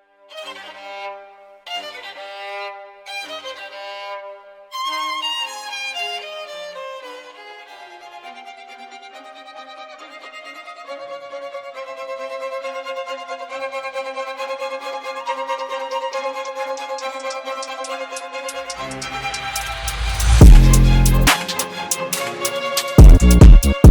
drill

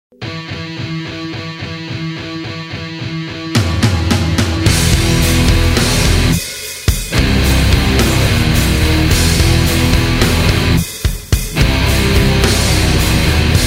instrumental

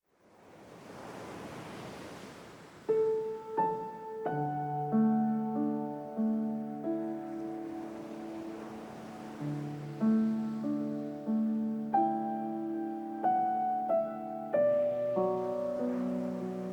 piano

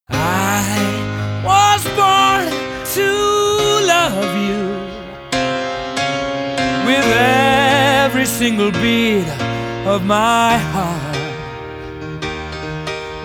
vocal piano version

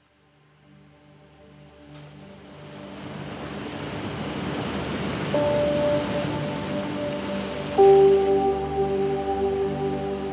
piano with ocean waves

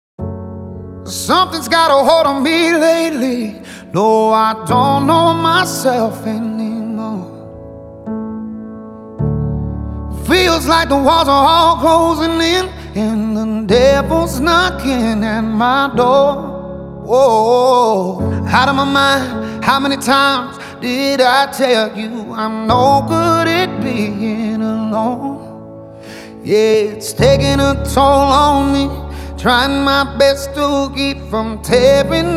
piano version

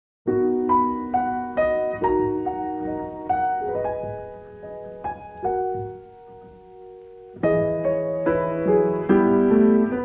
piano version

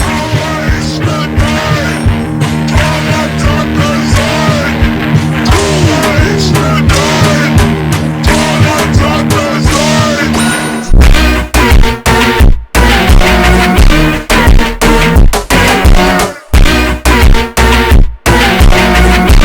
funk alt version slowed

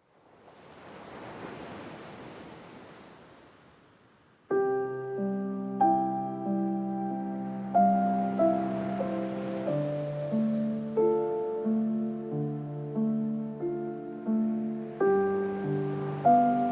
piano, blue sky waves

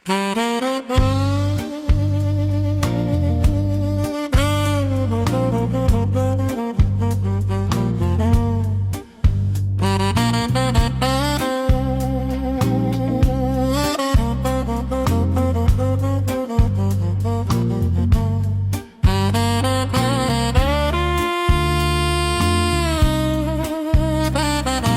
ukrainian beat

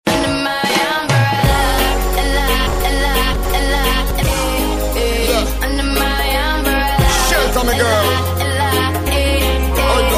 reggae remix

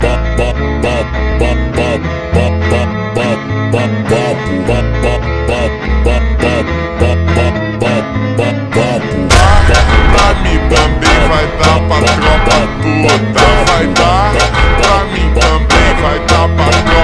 funk super slowed

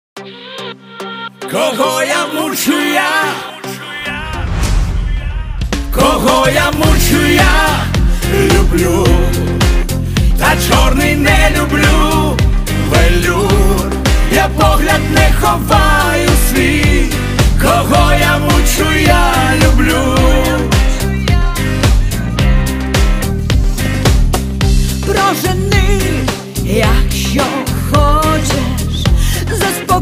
duet

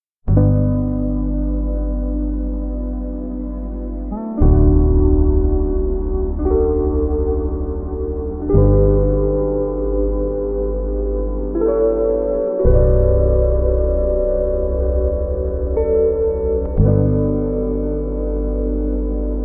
instrumental slowed muffled

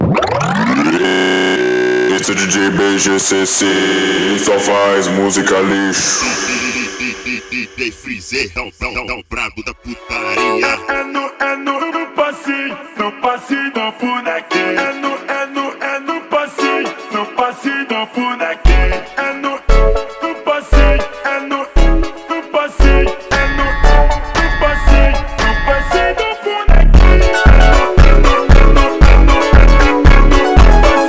montagem slowed

